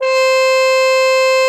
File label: cup mute C5; Xsample VOL-3